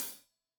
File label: Hat Loose, Hi-Hat, Cymbals Stereo